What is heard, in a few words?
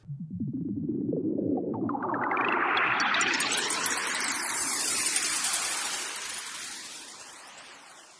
buzz in and out; Zaps and Beeps